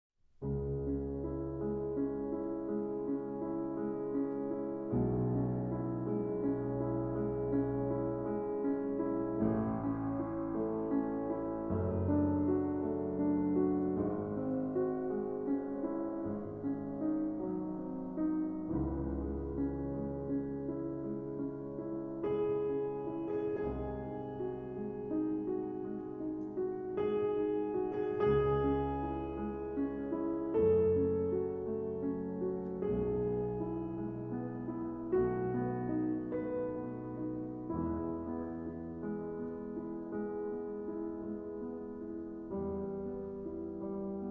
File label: Piano Sonata No. 14 in C Sharp Minor Adagio sostenuto